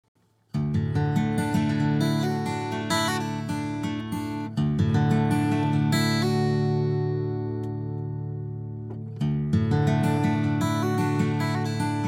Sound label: Guitar Sample 10a